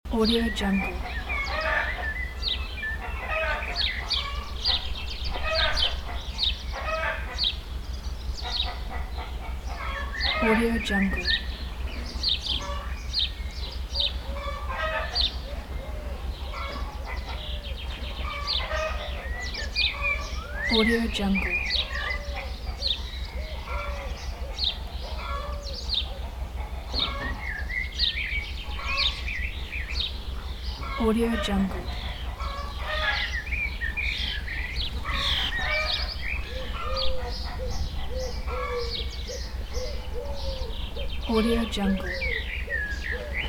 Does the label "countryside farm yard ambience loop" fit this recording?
Yes